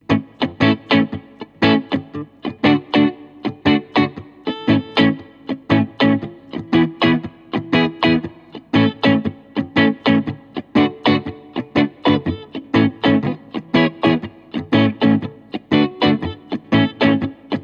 hh middle, Stratocaster HH; Ma toute première guitare BOW, avec 2 humbuckers splitables de chez Hepcat

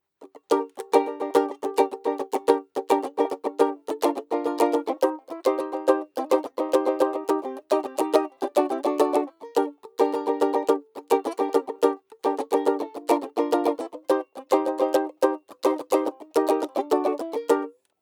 banjolele

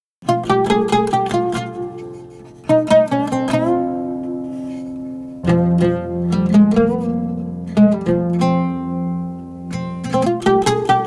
oud; Un superbe oud en cèdre et wengé du luthier turc Feramis Aktas. Oud irakien avec chevalet

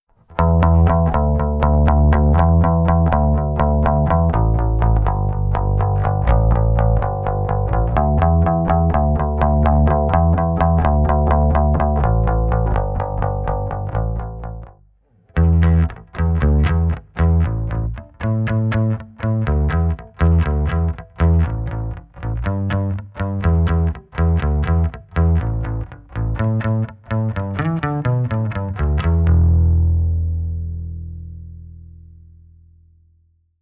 pick, precision bass; Bow Precision Bass 51 Amber; Première basse BOW

